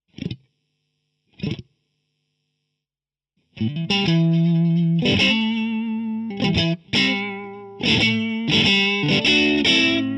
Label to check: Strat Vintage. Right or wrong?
Right